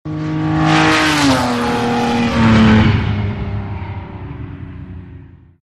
Car Race Speed

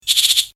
Rattle; TsumTsum

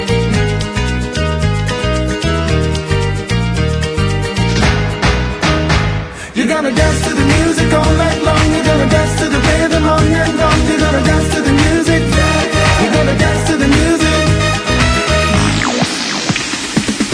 Music Medley

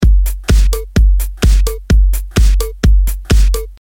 Best Beats

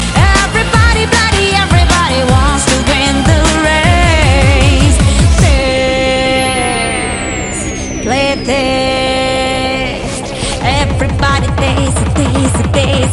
Female Version

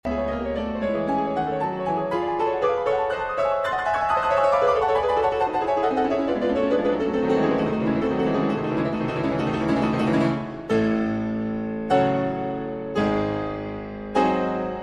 Prelude In Cm

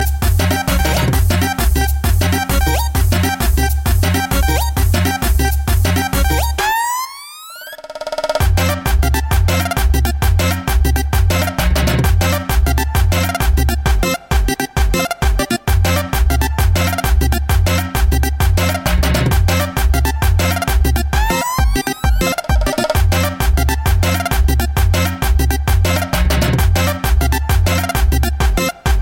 Tribal

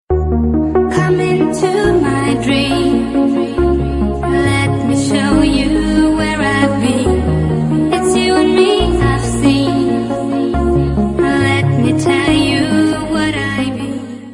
Intro Music

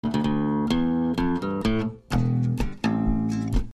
New Sms

